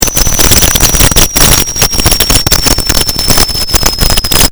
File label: noises